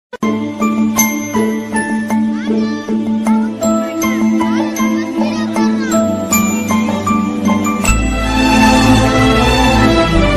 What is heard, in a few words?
tamil love bgm ringtone; yaradi nee mohini